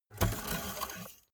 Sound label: car bmw e46 exterior oil rod pull out